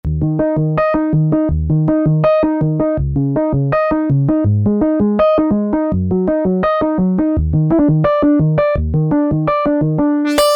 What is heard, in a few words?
Saw Hit